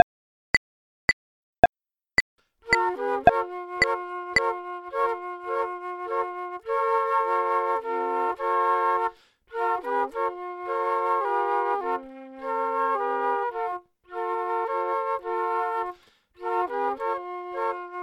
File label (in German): trio deutscher tanz